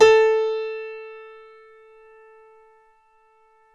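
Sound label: A3 piano right; Northstar - New Gold VOL-2; BOS.GRAND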